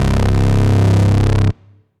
Low Speed, Analog Bass; Ableton; Factory Packs; Retro Synths; Analog Bass Vol 1

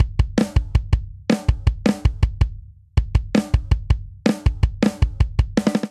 Punk Rock Breakdown 162 bpm, Drum; 4 4; MIDI Clips; Ableton; Factory Packs; Drum Booth

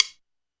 sticks; Drums; Ableton Foundation FreePacks

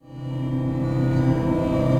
Cilium Pad, Ethereal Pads; Instruments; Ableton; Mood Reel

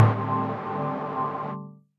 Noise Mallet; Press Keys; Tree Tone Presets; Ableton; Factory Packs; Inspired by Nature by Dillon Bastan

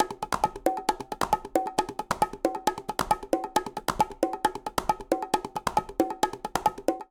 Rhumba Bongo & Quinta 135bpm, Latin Percussion; Ableton; Factory Packs

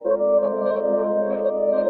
Slow Motion; Slow Motion 2; Inspired by Nature by Dillon Bastan; Emit Presets

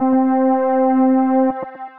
Sensible Pad, Synth Pad; Ableton; Factory Packs; Synth Essentials

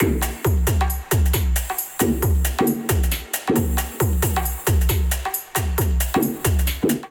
Kit 135 bpm, Drums; MIDI Clips; Jive Kit; Ableton; Factory Packs; Skitter and Step

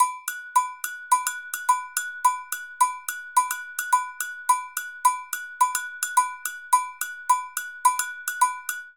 Afoxe Agogo 107bpm; Latin Percussion; Ableton; Factory Packs

